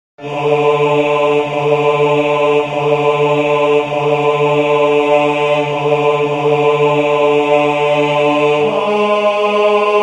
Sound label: Suscepit Israel bass